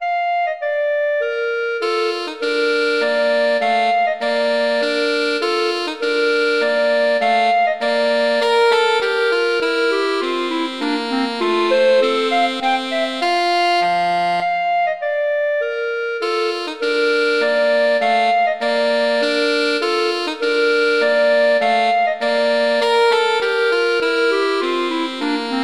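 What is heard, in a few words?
Duet, horn; Mozart; Duets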